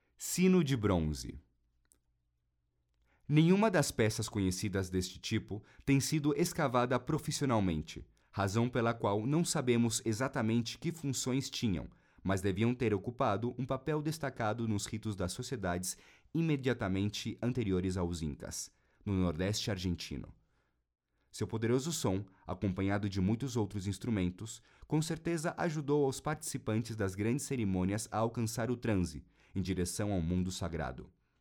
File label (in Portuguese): sino de bronze campana de bronce; audio-guias; portugues; introductoria